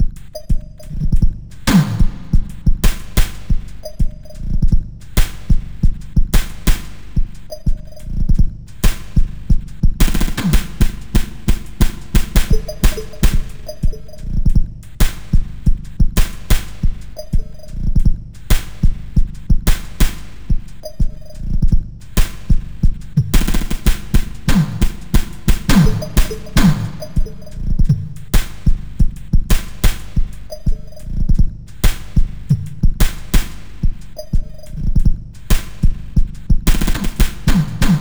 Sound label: Stem drums; ANG Impakter